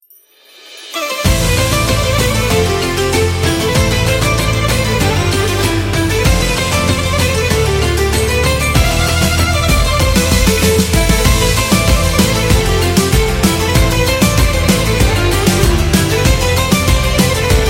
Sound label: Turkish